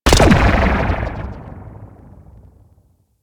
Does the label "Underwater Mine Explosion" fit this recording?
Yes